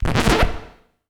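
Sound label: scratch, record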